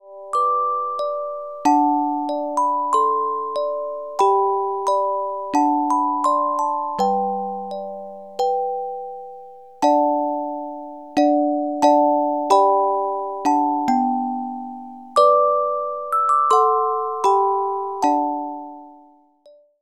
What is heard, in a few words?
Carillon Music Box Melodies